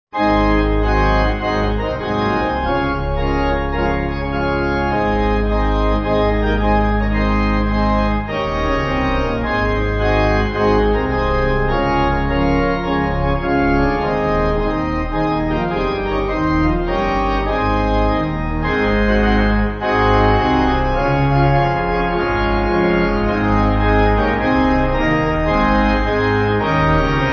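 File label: Pipe, Pipe Organ; What A Fellowship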